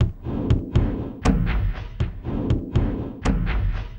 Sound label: Elektro Tap, Drums; Sonic Foundry (Sony Creative Software); Friendly Fire